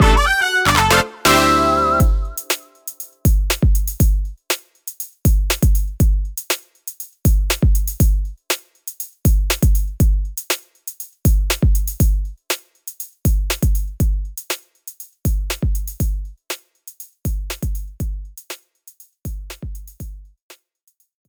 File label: BGM Opening Tatami; Clubhouse Games: 51 Worldwide Classics